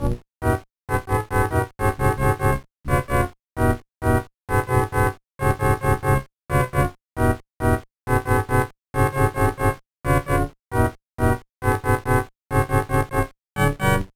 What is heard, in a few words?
Jingle, gba; NHL 2002